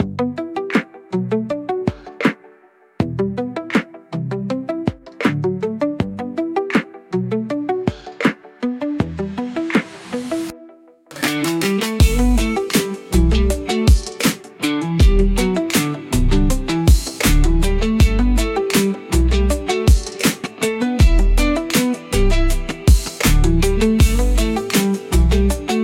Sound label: Pop